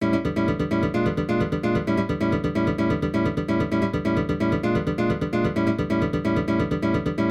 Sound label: backing fast; gipsy jazz